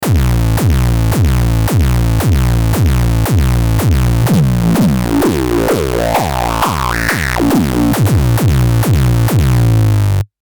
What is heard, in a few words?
synth kick